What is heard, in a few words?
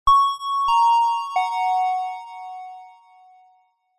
public places pa system tannoy bell electronic chime dry